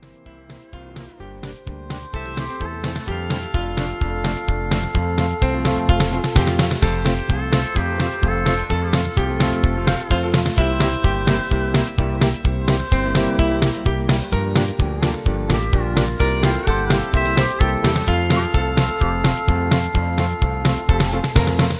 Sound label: Instrumental